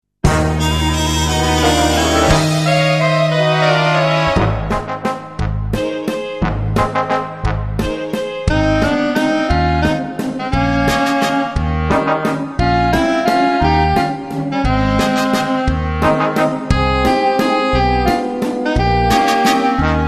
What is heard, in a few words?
alpine waltz